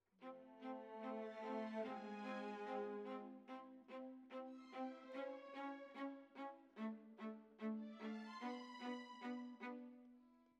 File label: Violas, jazz for piano